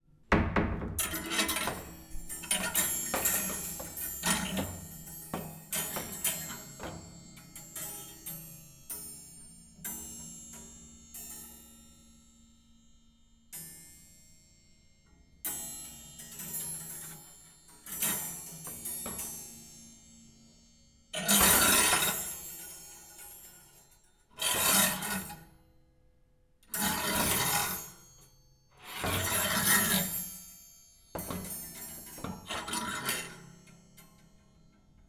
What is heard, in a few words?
Household, Wardrobe, Hangers, Hotel Room, Vietnam; Starbites